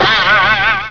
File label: toon noise